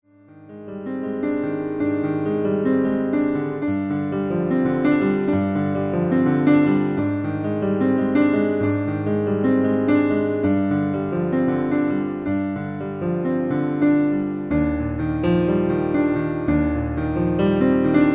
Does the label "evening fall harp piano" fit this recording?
Yes